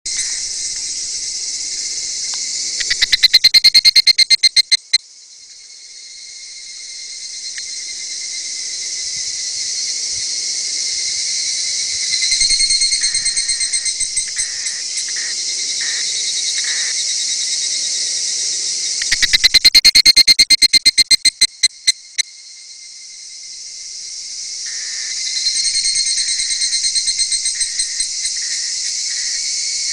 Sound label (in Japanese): ヒグラシの声 蝉 Sounds of Cicada; 自然音研究室